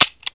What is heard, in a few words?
Gun Empty